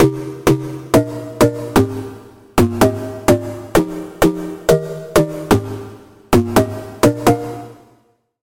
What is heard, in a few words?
Key, Sylenth1; Routed Sound; Sylenth1 Expansion - Star Scavenger Vol.1